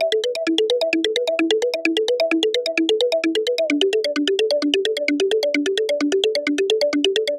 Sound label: 130 E Seq Mallets; Zero-G; Future Wave